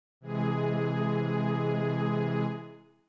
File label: Ab Ab Augmented